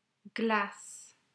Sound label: Glass